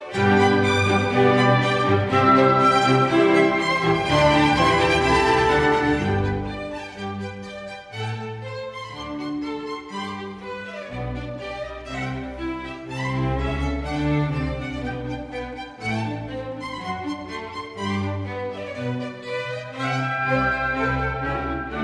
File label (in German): violinkonzert nr 3 g dur kv 216 allegro